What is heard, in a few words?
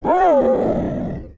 garm baby damage